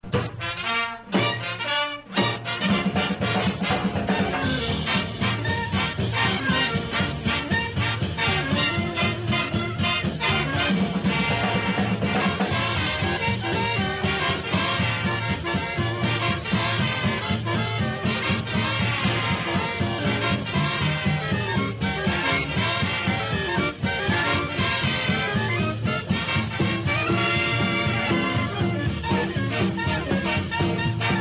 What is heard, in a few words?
bugle call